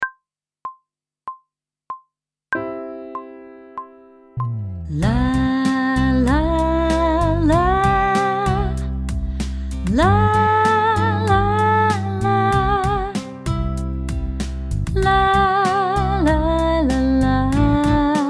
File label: Techical Work Riffs C major with Vocal; pop vocals